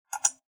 マウスクリック

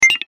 ゲームのボタン音

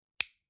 爪を切る音

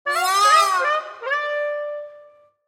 失敗した時の残念な音

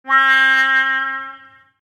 だらしのない音

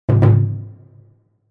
和太鼓を鳴らす音

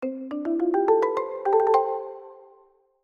木琴のアイキャッチ

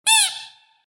握ると鳴くおもちゃ

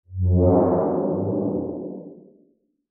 臭い音

